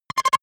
button, signale; soundpool

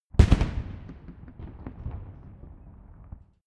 rifle salute fire; comedy-cartoon; pistolen-gewehre

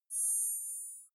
zoom in long

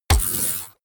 sci-fi click positive medium; maschinen; signale; soundpool

